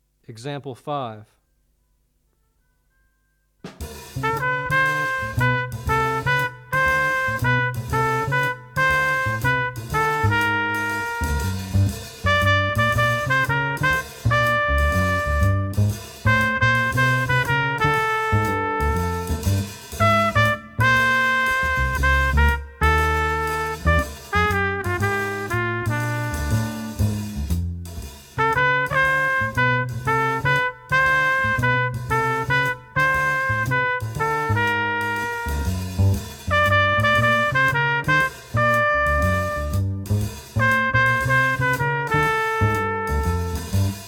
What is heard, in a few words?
two horn audio examples